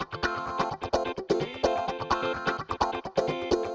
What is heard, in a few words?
Electric Guitar 128BPM F maj; Vengeance Samples; VEH3 Electric Guitar Kit 2